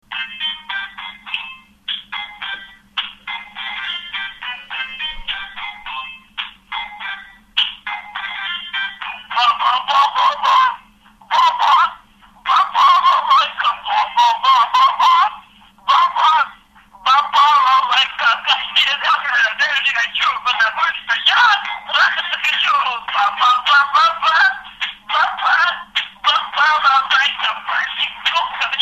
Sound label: Balalaika